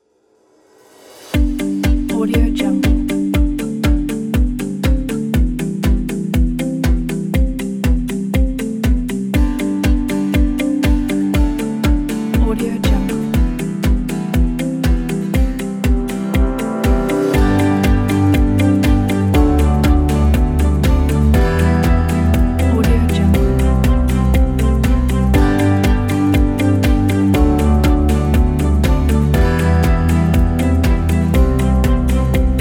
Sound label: motivational kit